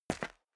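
step dress boots concrete grit single right, footsteps; schritte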